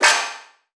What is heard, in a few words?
Multimedia Internet CD-Rom Flash Long